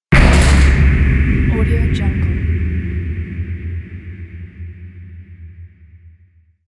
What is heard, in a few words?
detonate; fx-channel